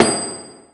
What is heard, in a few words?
arena; comms; Cosmic Rage